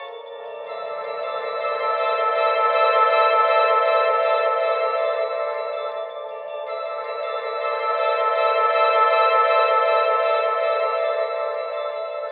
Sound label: Lobby Music Loop